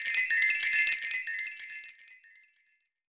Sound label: Sarna Bell; Roland BK-5; Percussion